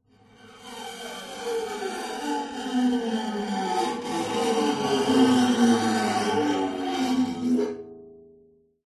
musical guitar steel string knife scrape strings weird abstract scraping tone